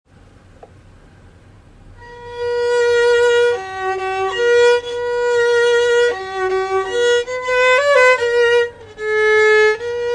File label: waltz; Brahms